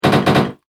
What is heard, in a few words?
Rapid Machine Gun Shots; Machine Gun Sound Effects